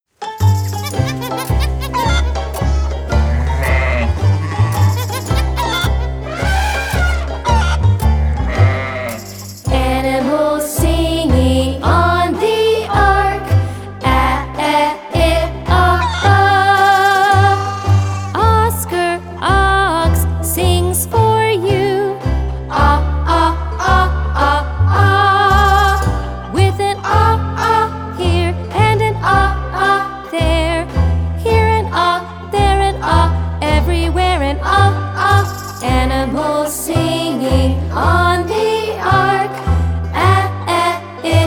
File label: Animals Singing O